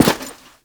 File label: Step Default; Warframe; The New War; Characters; Veso